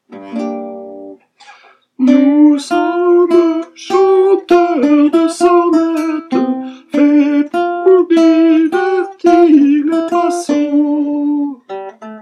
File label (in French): nous sommes chanteurs tenor; chorale